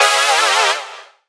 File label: Synth Shot; Vengeance Samples